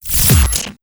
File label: WARP MICRO FX